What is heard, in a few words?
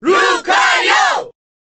Cheer Korean; Lucario; SSBB